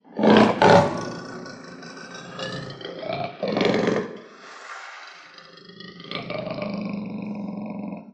Leopard Growl Nasal; big cats

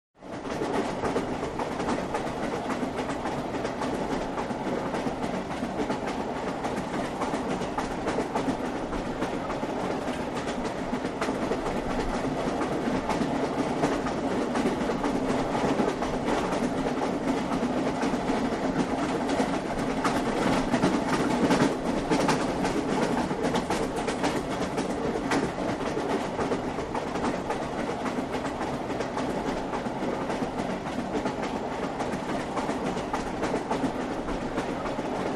Train Int Ext Steady, train int

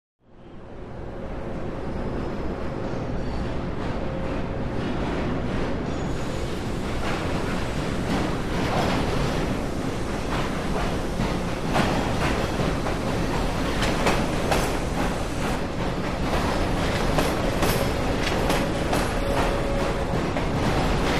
Subway Platform Slow, subway

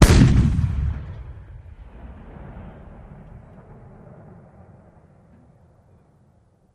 Cannon Blast Single

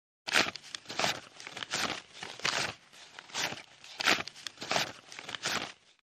Count Bills, Money Counting; Pull Money Bills From Clip, Rapid Counting, And Place Into Pocket.

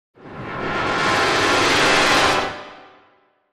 Brass Sec Tension Cre, brass section

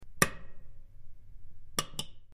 Button Old Button Pre, switch clicks

